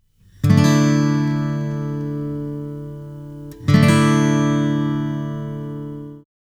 Dsus2, guitar

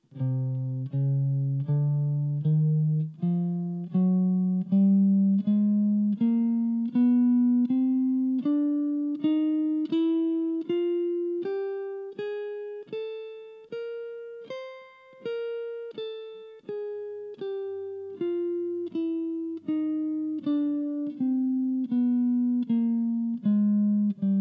Aeolian Chromatic, guitar

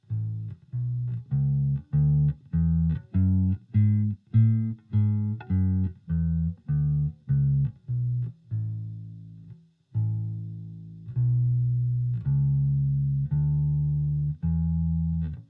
A Major Scale, guitar

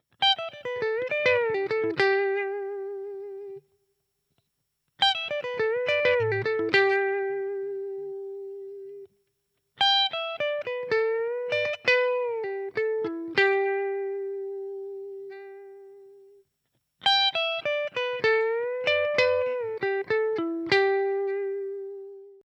Pedal Steel Lick, guitar

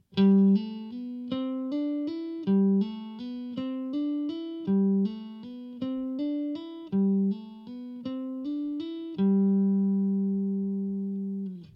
Legato

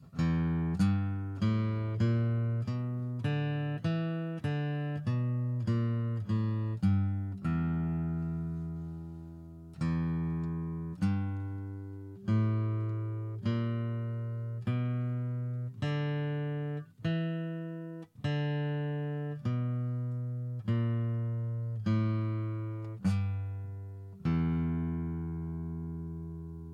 E Blues Scale, guitar

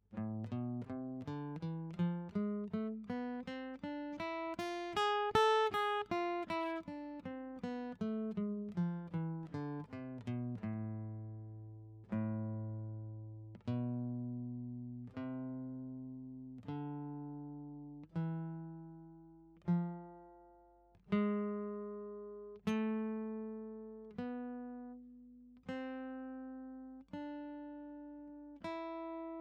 A Natural Minor Scale, guitar

